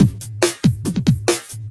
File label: Loops BB 140, BreakBeats; Vengeance Samples